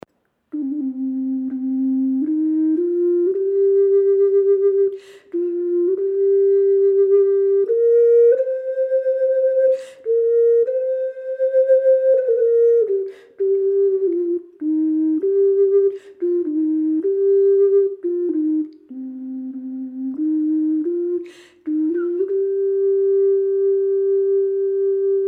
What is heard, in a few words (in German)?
Indianisch Bass C, ocarina; Indianische Ocarina